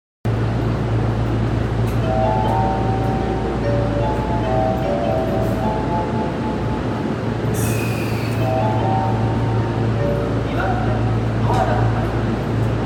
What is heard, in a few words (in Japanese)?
JR常磐線北千住北